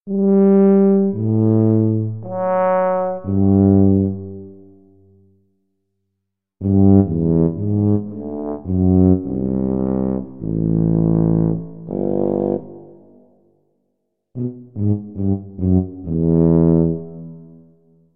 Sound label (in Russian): zvuki tuby